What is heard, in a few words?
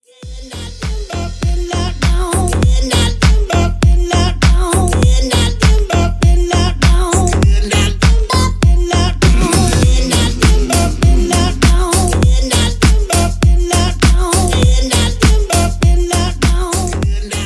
bass bones; norden-sounds